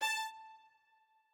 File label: strings